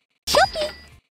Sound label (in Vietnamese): Nhac chuong thong bao; shopee